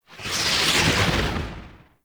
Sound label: Bullet Jump Whoosh, Vehicles Motorcycle; Warframe; Motorcycle Ninety Nine